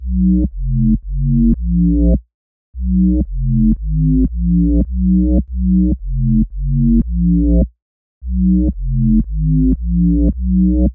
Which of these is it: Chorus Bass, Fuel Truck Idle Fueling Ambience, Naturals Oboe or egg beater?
Chorus Bass